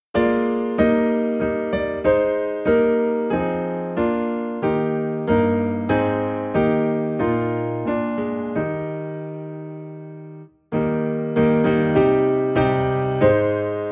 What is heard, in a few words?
Piano in E Flat